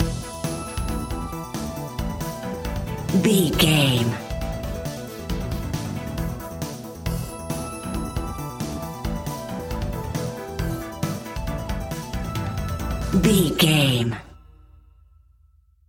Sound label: 80s synth rock anthem